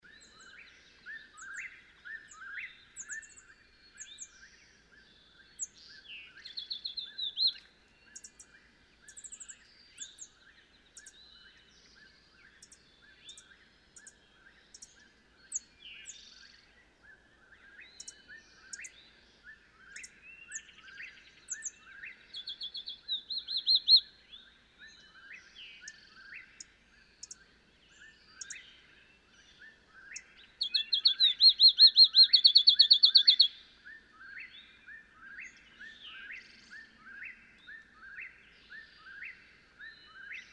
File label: Field Sparrow